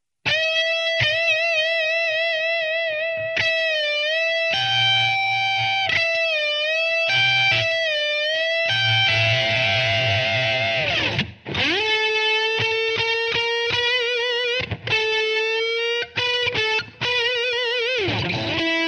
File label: Rock guitar; yao gun ji ta